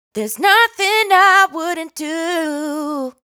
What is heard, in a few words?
hook Nothing 140 Bb, Dry Vocals; Raw Vocals; Slate Digital Omnivox Vocal Sample Pack